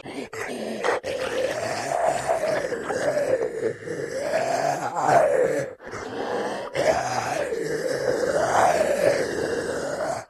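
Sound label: zombie sound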